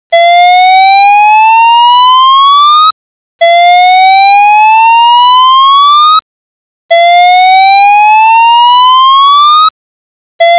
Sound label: Siren Tone